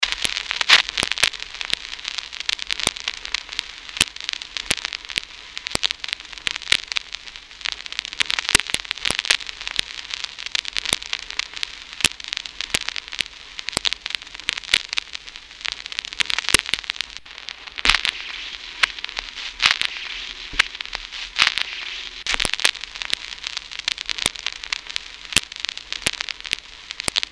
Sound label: vinyl silence